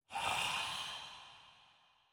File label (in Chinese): 灵魂疾行声